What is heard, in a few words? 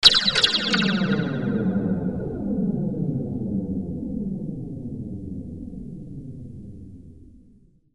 WEAPONS